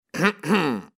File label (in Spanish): Carraspeo Hombre